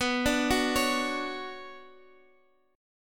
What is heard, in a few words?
B Minor Add 9th B, chord; Bmadd9 arpeggiated; madd9:1,b3,(5),9; JGuitar